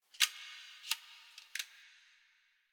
Computer Room Floppy Disc; Warframe; Ambience; Nineteen Ninety Nine

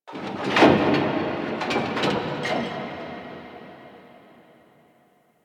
Guild Mid Mech; Ambience; Warframe

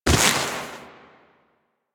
Step Snow; Enemies; Foley; Footsteps; Warframe; Duviri; Dax

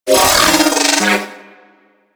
Vocal Pain, Enemies; Warframe; Riot Moa

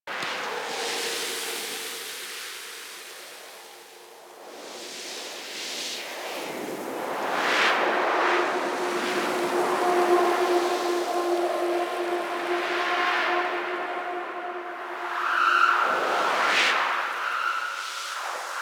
Landscape Wind Medium Loop; Warframe; Ambience; Venus Open World

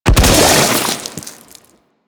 Modular Secondary Barrel Explosion, Weapons; Warframe; SU Modular Guns

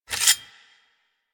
Dagger Equip; Warframe; Weapons; Dual Daggers

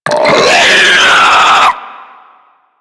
Infested Ancient Attack; Enemies; Warframe; Puss Ancient

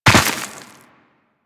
Land Dirt, Footsteps; Warframe; Tenno

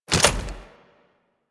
Flak Cannon Equip; Warframe; UT Flak Cannon

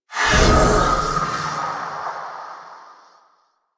Shrine Pickup; Warframe; Revenant

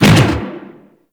Fist Whoosh; Warframe; Weapons; Archwing; Melee; Regor Fists

